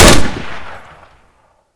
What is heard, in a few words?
shot, weapons